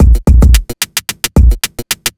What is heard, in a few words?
block rock kit